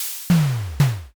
press

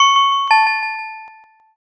pinpon bell

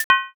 use key

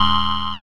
monster insect

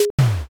toaster

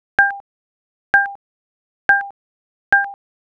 drip

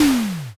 rock crush

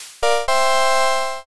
next preview

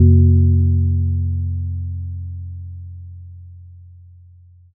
gong

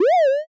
punyon

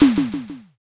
pistol

